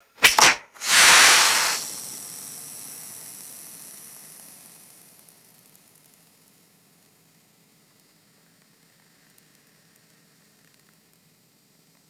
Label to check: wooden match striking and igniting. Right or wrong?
Right